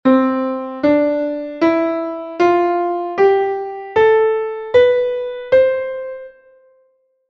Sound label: scale chaos